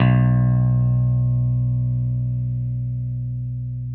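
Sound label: 6 string bass; Sampleheads; Whole Lotta Country 1 & 2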